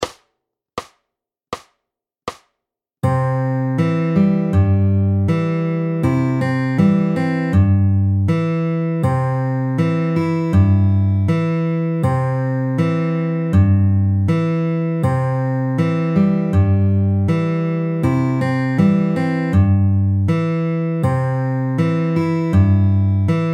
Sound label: In Betweens quarters SLOW